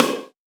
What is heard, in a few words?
speaker hit